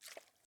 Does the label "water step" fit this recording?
Yes